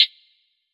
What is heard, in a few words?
Hat, Closed Hats